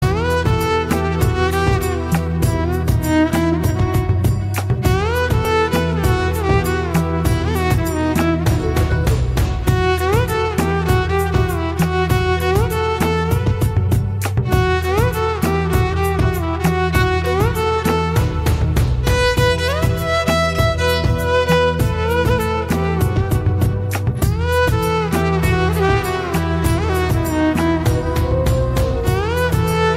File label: Violin Ringtone